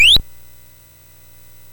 Ducks Ahoy, MOS6581R4

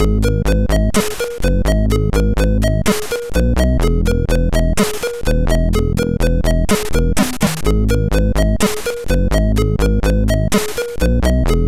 Flying Birds sid MOS6581R4; hvsc

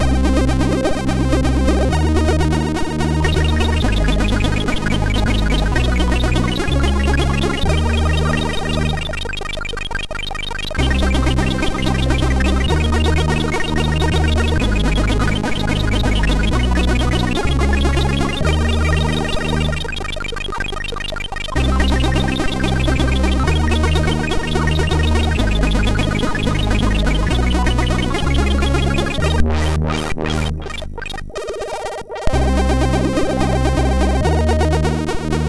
Metall Glimmer, MOS6581R2

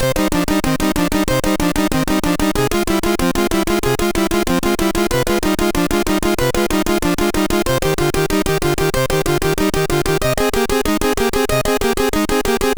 MOS6581R4 sid

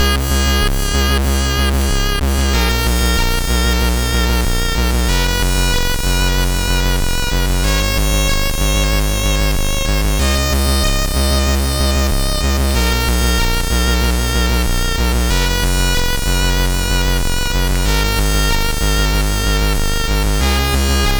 Game Over Tune, sid CSG8580R5

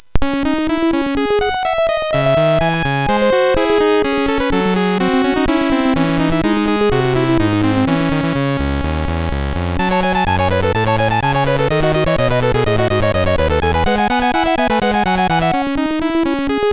Classical, MOS6581; MOS6581R4

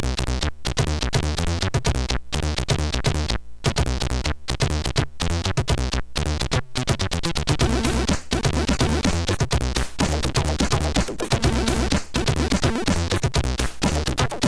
Disco sid CSG8580R5; hvsc

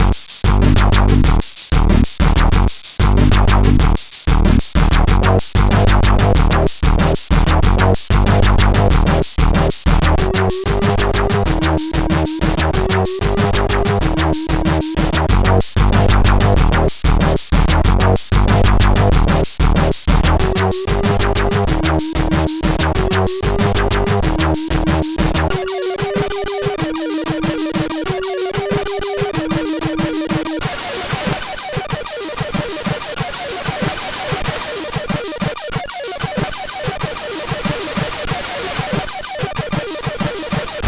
Techno, sid CSG8580R5; Back 2 Techno; hvsc

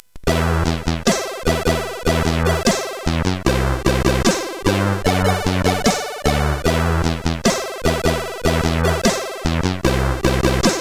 Success, CSG8580; hvsc

